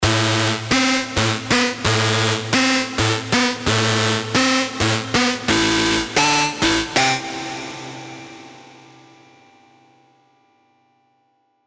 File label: Warning Signs, Serum; alonso 18 year anniversary pack